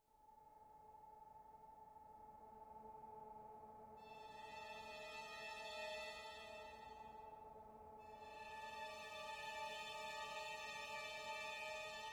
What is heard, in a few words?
ambiant dark; Quivr; PS4 Windows gamerip 2016